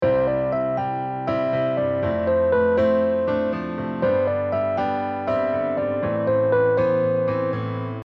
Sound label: melody 7th